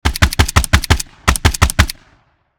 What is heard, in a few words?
Slow firing machine gun multiple bursts; guns